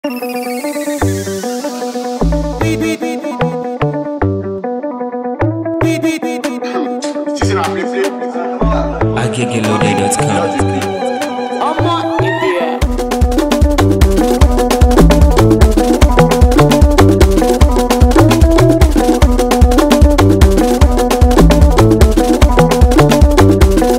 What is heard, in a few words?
Beatz Afro Marakosa